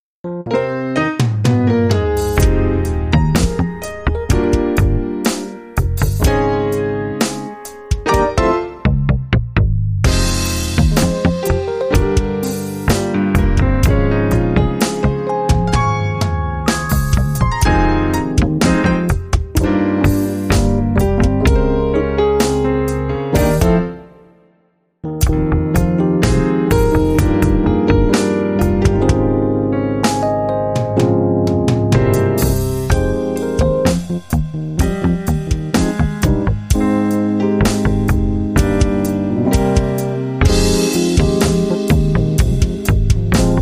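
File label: Slow Jam, 63 bpm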